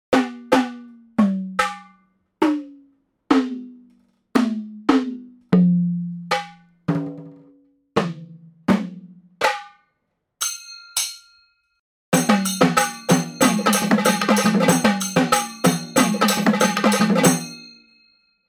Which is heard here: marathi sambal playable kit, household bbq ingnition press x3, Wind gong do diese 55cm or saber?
marathi sambal playable kit